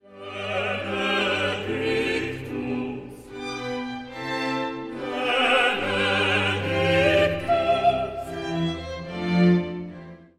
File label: Benedictus Quartet